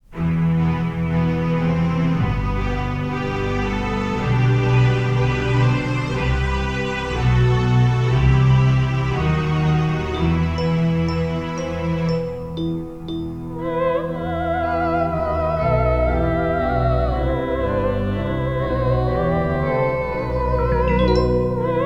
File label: Theremin; AChristmasCarol